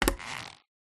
foley crutches on wood floor with handle squeak single